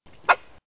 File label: bark